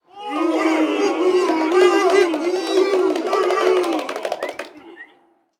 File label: Street Ball Crowd Boo; SFX Kit; Crowds